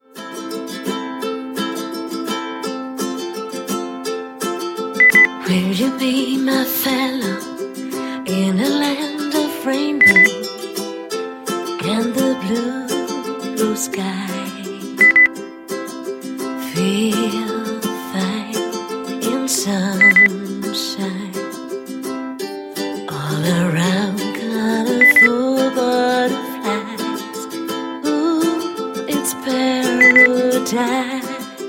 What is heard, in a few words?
gesang beep